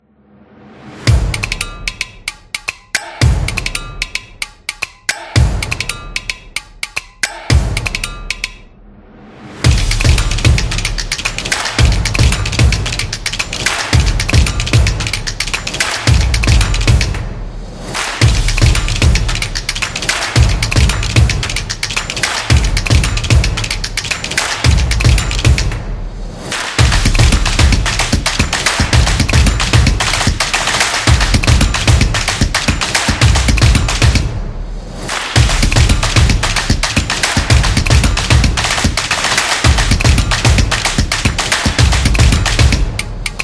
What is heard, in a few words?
The Drum Dynamic, soccer drums